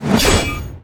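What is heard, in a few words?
attack katana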